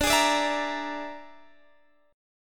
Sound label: Bb 7th Flat 5th D